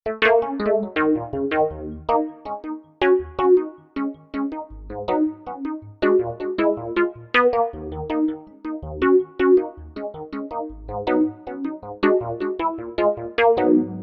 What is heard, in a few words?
elektrik, elektrikpiano